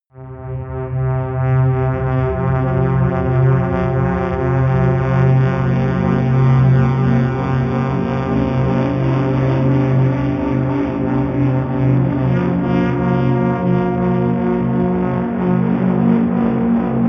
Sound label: drone crazy elephant